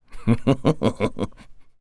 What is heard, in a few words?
taunt hehehe